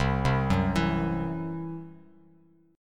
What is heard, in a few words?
C-Major Flat 5th, Bass Standard; arpeggiated